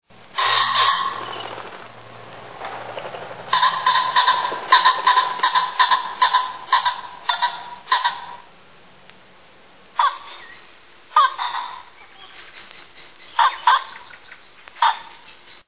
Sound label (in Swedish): Fasan